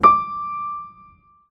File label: piano d6